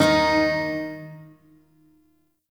Shawzin Oct One D Sharp; Warframe; Duviri Shawzin

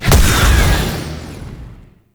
Impact Small; Weapons; Warframe; Duviri; Incarnon Adapters

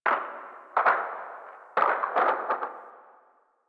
Landscape Glacier Ice Distant Small, Ambience; Warframe; Venus Open World; One Offs

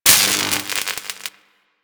UI Crafting Spark E; Warframe

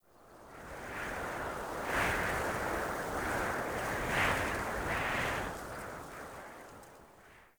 Mountain Wind Gust Whistle Soft

